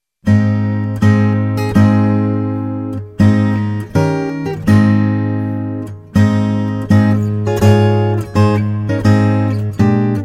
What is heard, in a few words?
Bridal Chorus